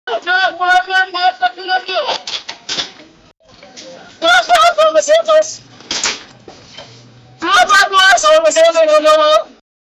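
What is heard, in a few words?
shop keeper screaming; sound effect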